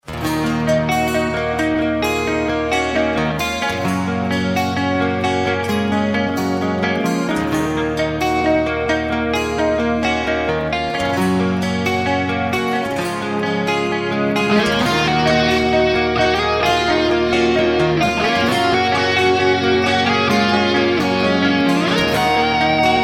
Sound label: falling rain, rock